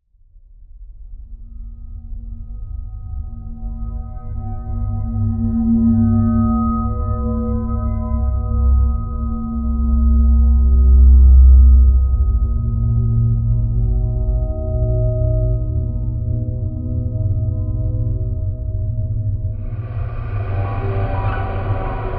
Haunting Soundscape 120BPM, Cinematic Trailer Music; Soundscapes